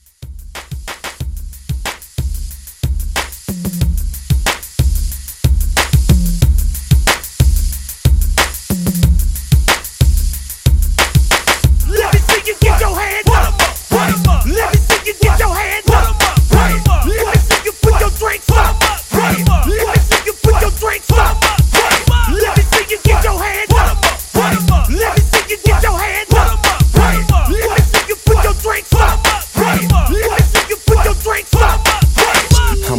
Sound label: hype, 92; 2024 Hype Re-Drum